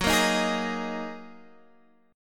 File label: Gb 6th Add 9th Gb down Guitar Half Step Down; Gb6add9 strummed